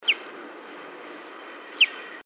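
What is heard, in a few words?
chaffinch coelebs flight call; eisfeld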